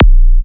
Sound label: Minimal Kick, Drums, Kicks